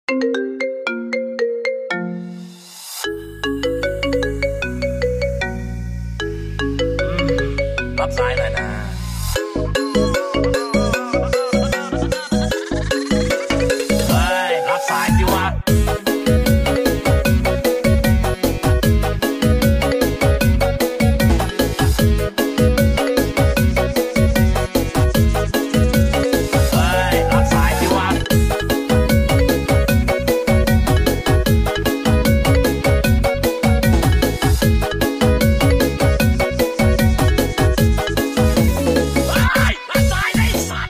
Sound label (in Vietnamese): nhạc chuông iphone; เสียงเรียกเข้าไอโฟน